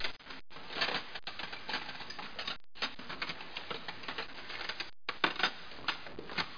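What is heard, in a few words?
silverware; Image Bonanza; Expert Software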